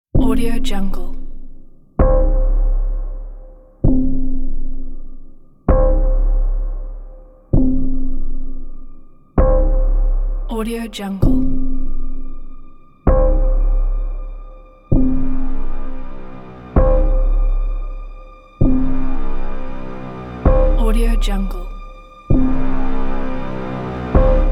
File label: cinematic dark intense anxious; alexveis